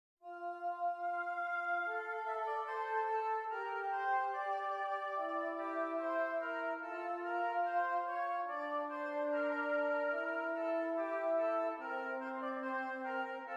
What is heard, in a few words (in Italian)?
esercizi di contrappunto semplice A due voci